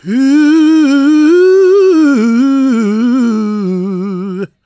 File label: GOSP MALE, SOLO; Spectrasonics; Vocal Planet